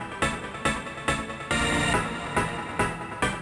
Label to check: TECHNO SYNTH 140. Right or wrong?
Right